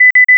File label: sentry engage; snds jack gmod